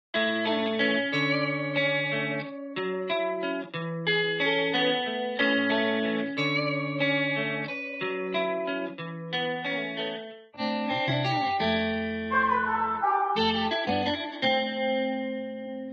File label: Ardent Guitar Loop; WavSupply; Nash